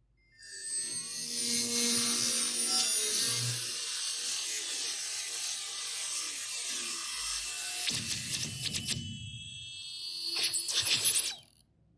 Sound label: matrix rain effect; mainframe